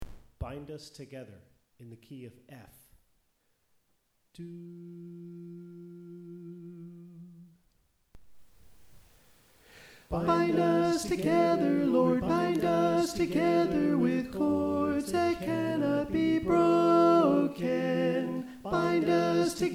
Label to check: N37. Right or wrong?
Wrong